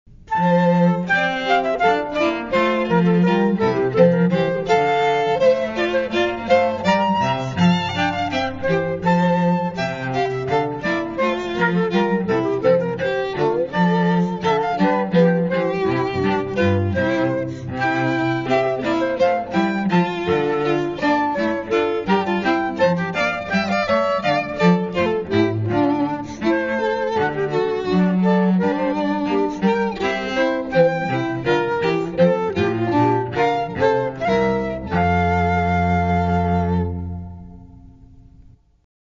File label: Hornpipe; Handel; Water Music